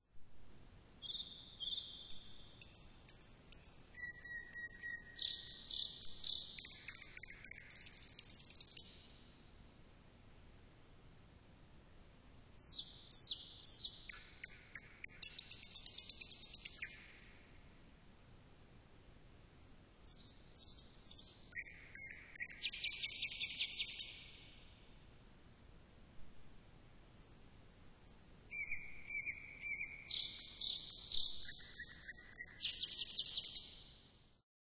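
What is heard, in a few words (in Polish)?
Słowik; samodzielna nauka; przedszkole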